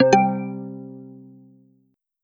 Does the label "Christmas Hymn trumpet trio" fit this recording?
No